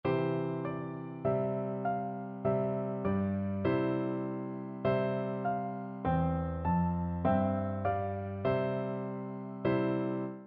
kaeru no uta, piano duet

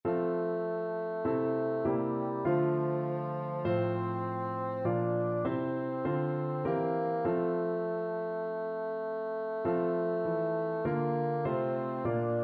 abide with me, bassoon

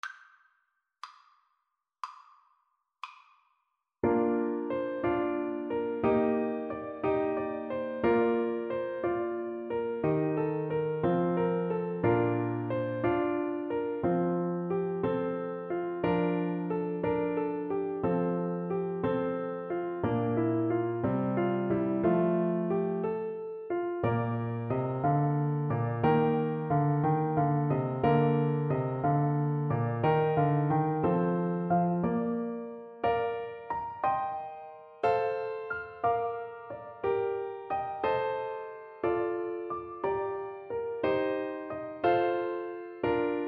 pastorale TPT

